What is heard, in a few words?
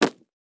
Pigeons